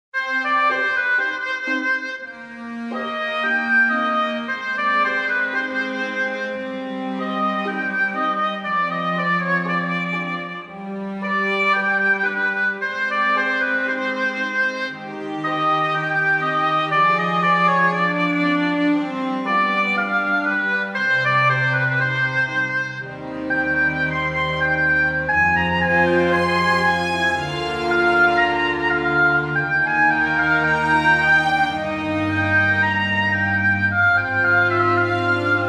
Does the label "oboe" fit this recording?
Yes